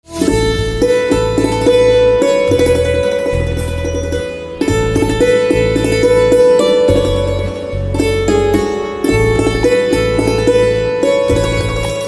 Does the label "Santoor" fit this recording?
Yes